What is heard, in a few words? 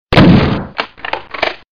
Super Shotgun; Doom 2